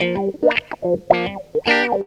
GTR, Funky Elements GTR RIFFS; Zero-G

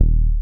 Standard Bass E, One Shot Bass

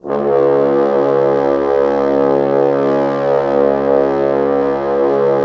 Orchestral Brass

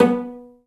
Cell Pz B3; Digital Sound Factory Virtuoso

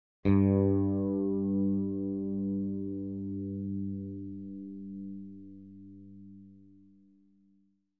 bass bright g2; Sound Sampling Collection VOL-5; Drums & Bass Fusion Funk Effect

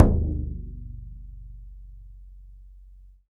BODHRAN, Celtic Percussion

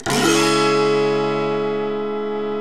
AUTOHARP; Roland - LA Composer vol. 1

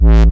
hardcore, BASS, Techno Trance

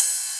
Rides, Cymbals

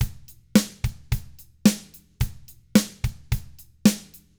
POP ROCK Drums; 109POP ROCK

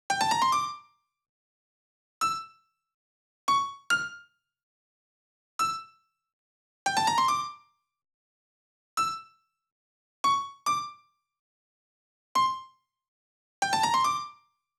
PWM Kit 142BPM Chorus - Piano DRY, Gmin; Constr Kits; Melody & Synth; Vengeance; Essential Clubsounds Vol.5

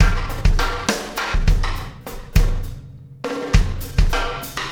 Wormhole Beat, Processed Drumkits

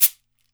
CABASA, Brazil Drum Perc; E-MU Producer Series Vol. 7 – Old World Instruments

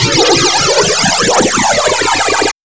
stabs; stabs & hits

